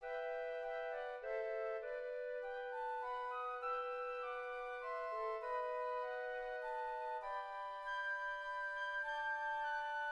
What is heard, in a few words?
Hymn Flutes